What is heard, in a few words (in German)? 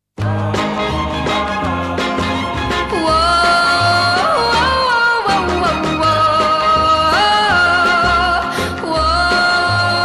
Rechts Links Mitte Stereo